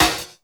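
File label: snare drum, drum & bass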